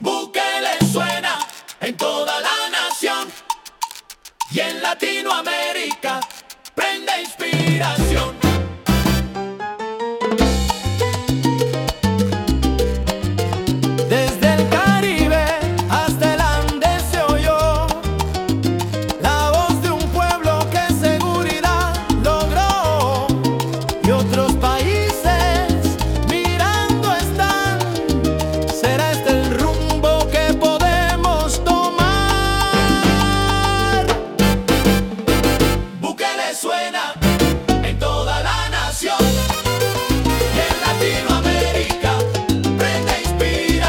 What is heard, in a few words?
SALSA